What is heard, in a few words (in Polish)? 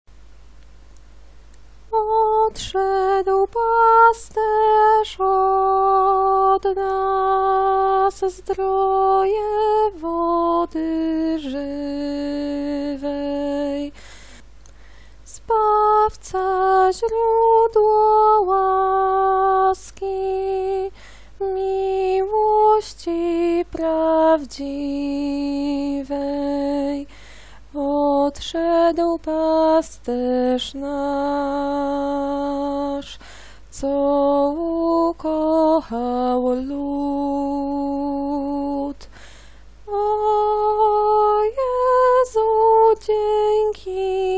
sopran; Wielki Czwartek